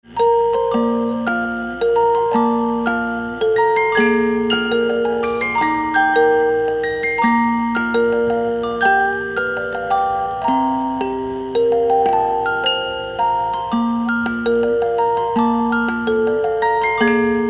music box

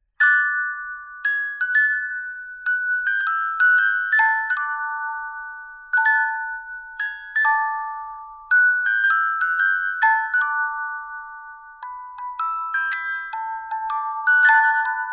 musicbox

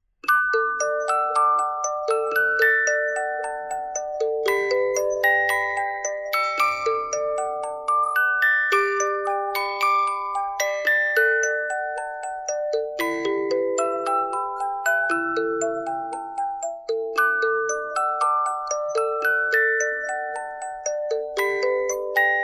Irish Lullaby, musicbox

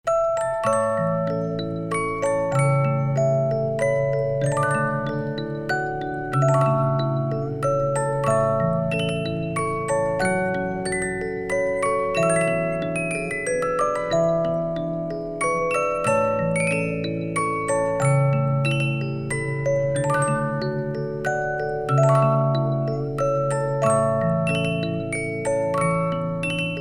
Amazing Grace, music box; SANKYO

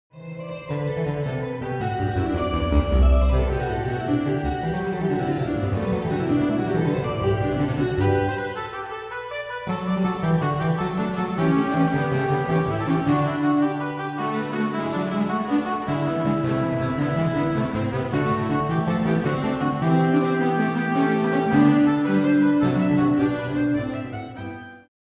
fugue in g; birolius editions

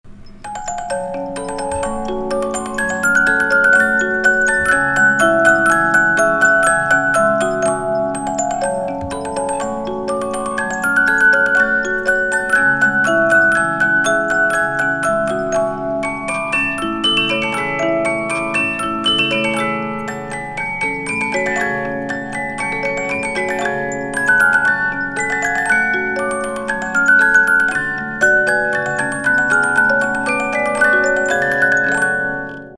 magic minuet turkish 72 note, musicbox

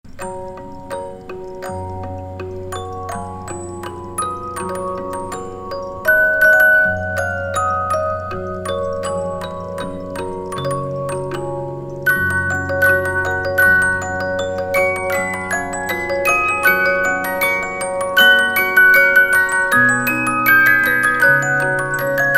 magic flute note, music box; 72 note

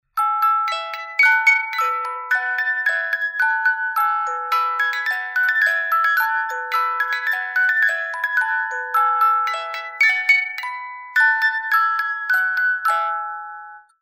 Twinkle Twinkle Little Star, musicbox

